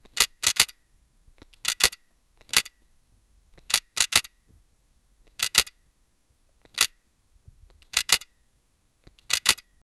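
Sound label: touching metallic old camera, photography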